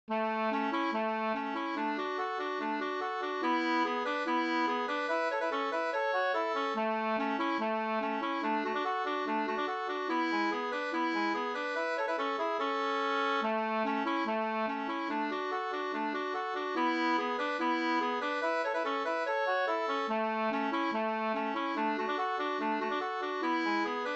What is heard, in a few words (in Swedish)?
stjuls, Adur